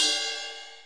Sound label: ride